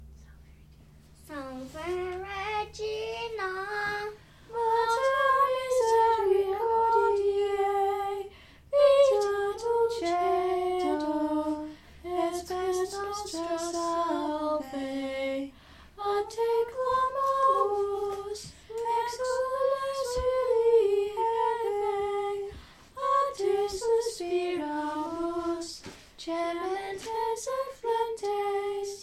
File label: Marian Antiphon